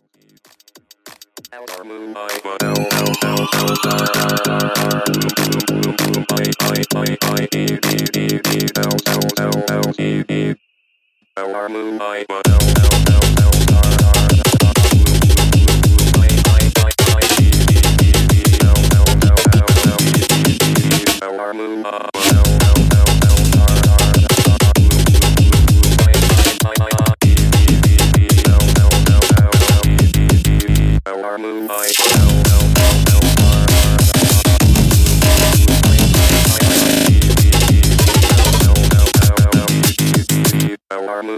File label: fight music remix